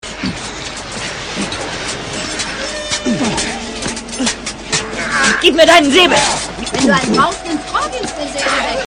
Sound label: aargh